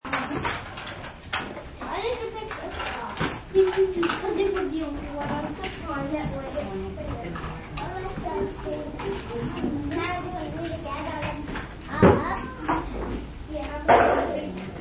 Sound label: Kids Play Toys